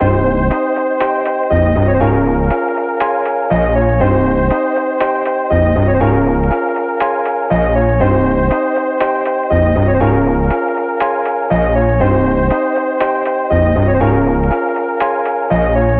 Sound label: 120 BPM F Min, Trap Melodies; Delirium; Cymatics; Trap Starter Pack